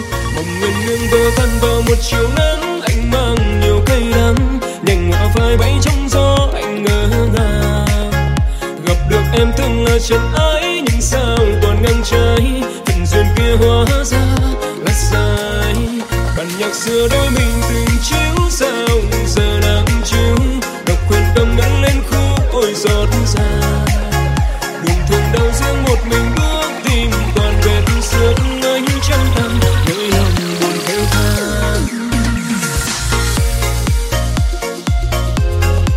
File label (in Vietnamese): nhac chuong remix